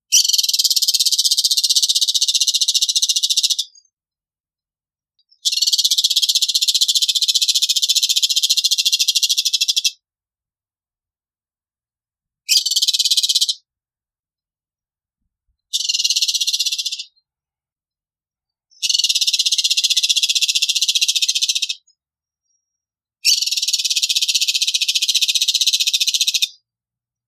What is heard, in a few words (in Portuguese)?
Canto do periquito de encontro amarelo